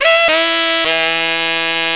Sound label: Rock Sax; Deluxe Music 2